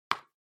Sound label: Trackpad (Tap)